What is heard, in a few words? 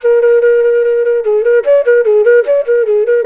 FLUTE C08, Indian Traditions; USB Soundscan vol.45; AKAI